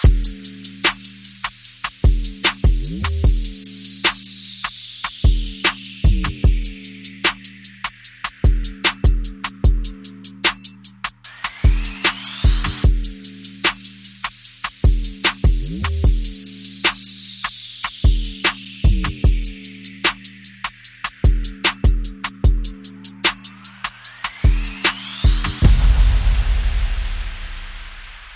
Perc and FX

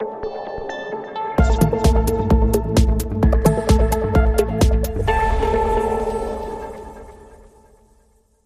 Sonic Dance

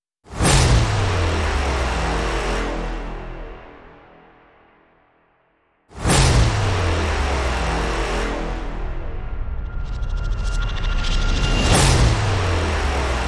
Deep Threat, No Choir

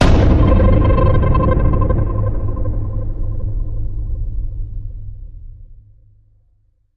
Hit Iron Barrel

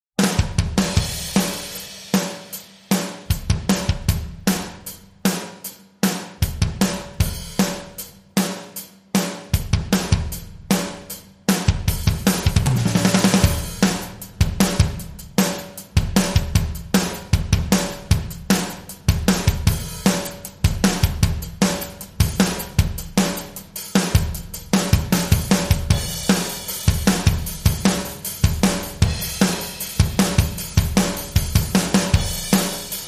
Drums Only

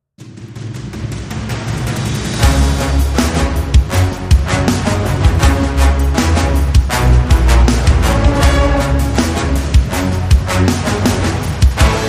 Hybrid Sting

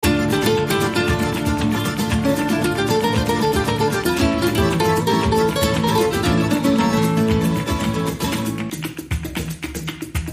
Flamenco

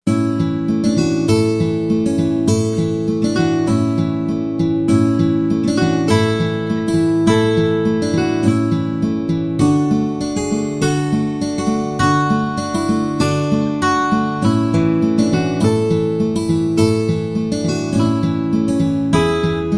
Simple Folk

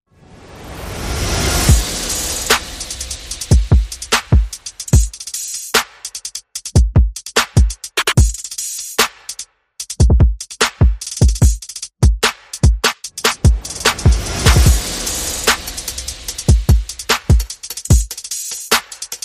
Perc and FX

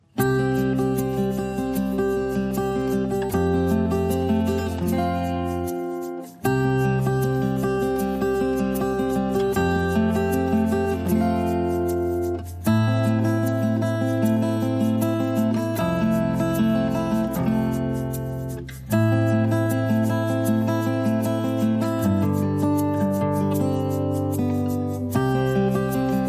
No Glockenspiel

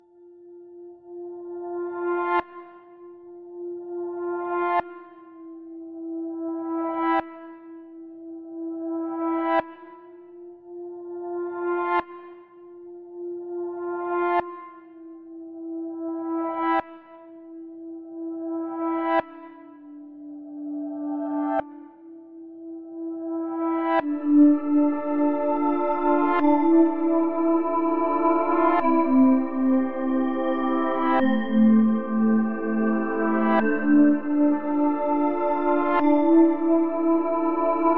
Ambient

